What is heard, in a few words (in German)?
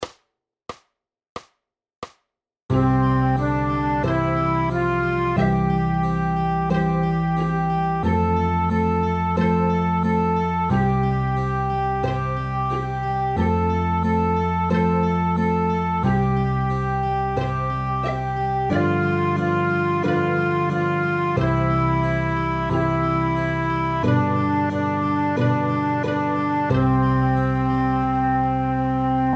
Alle meine Entchen playalong